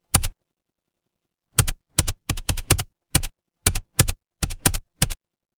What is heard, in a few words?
office keyboard